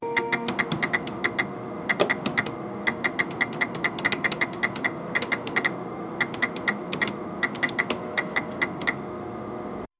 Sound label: warehouse